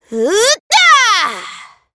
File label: battle ring; dqxi; veronica